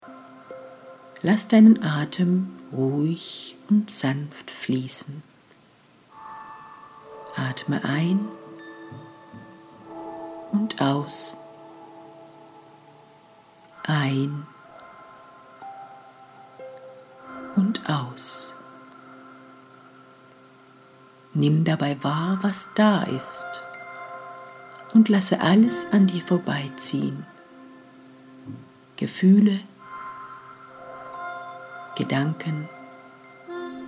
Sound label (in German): Tinnitus Frequenztherapie Hoerprobe